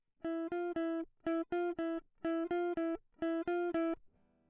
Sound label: electric